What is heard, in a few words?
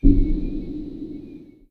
doors fx